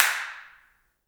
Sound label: handclaps, Heart of Africa; Spectrasonics; Heart of Africa 1